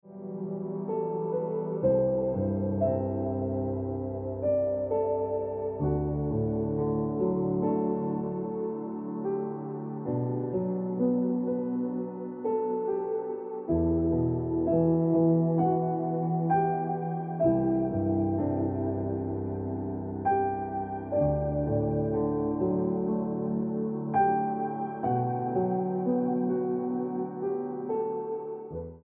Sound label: Piano Music